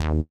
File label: D#3 bass